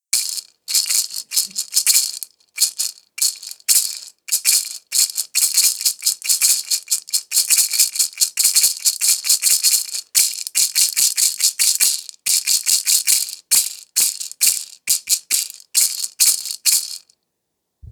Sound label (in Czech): samanobri, samanske chrestidlo obri